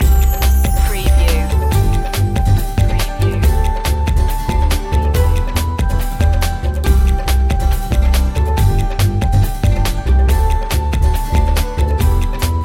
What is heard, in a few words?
india flower festival loop